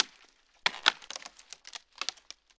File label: foley tree branch rotten twist break; sound effects one